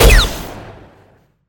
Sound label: weapon; Vulkan's Veagle